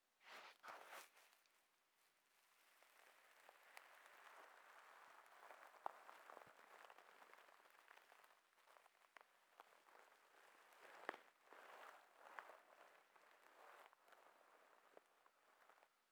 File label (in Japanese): 左耳を下に膝枕, 耳かき系